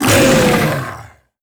Boss Hit Light; netent

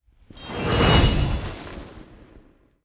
multiplier Increase Lightning; netent

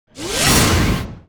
sparks wild Expansion Wind Up; netent

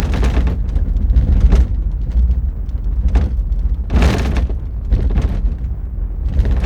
Stampede loop; netent

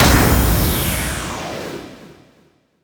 fs Splash Start; netent

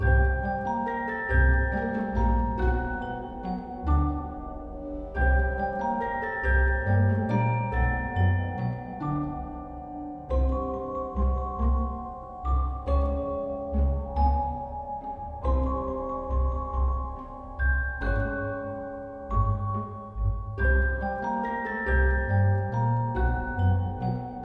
intensity 3 music; netent

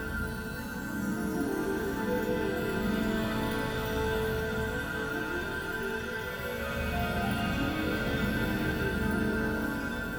feature Splash Loop; netent

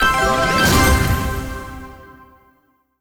sparks medium win; netent

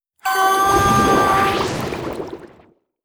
feature

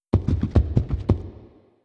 wild Movement; netent

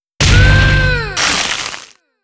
fs Blue Heavy Attack; netent

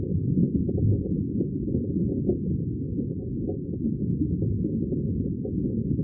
reel Spin; OceansGold; netent

